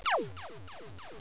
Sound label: laser; spacegame